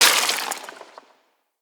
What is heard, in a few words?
Melee Heavy Air Slam Impact Dirt; Whooshes; Jump Slam